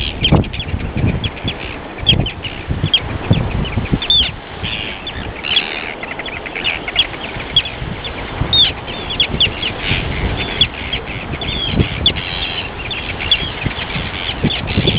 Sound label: arctic tern